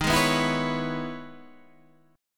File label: E Major 13th chord